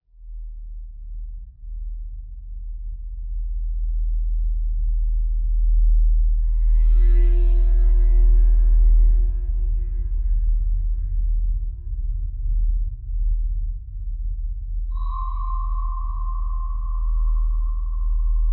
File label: drone